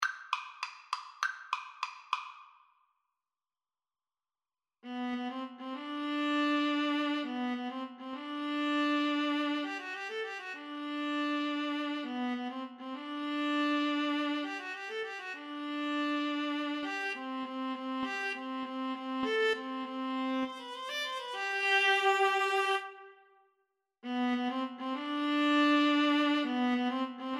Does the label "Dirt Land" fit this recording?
No